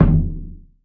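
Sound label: bass drum HRD, 30S JAZZ; Northstar - New Gold VOL-1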